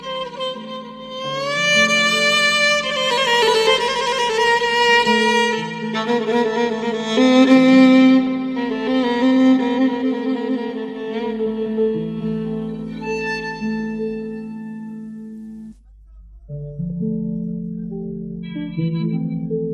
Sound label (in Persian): Saz Va Avaz